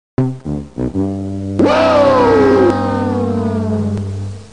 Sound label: The Price Is Right Horn sound effect; Ric Flair